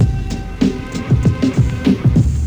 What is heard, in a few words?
break; awe32